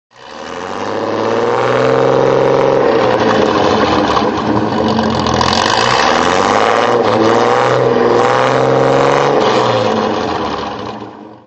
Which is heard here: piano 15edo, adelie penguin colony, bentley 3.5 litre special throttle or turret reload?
bentley 3.5 litre special throttle